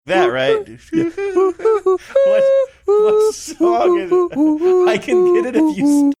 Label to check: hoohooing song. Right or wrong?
Right